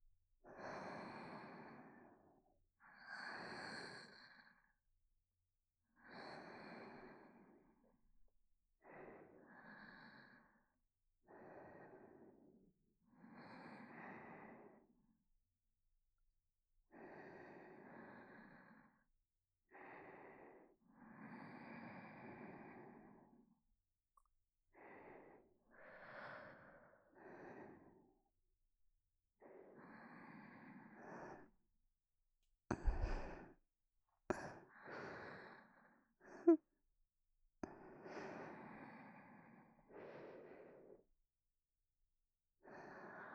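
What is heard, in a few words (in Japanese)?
両耳に寝息ループ; 日々姫と真闇の姉妹耳かき; 両耳に花の寝息ループ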